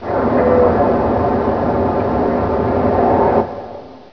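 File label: glass door; chasm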